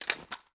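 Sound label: weapon assault rifle aim down sights down; gmod; viper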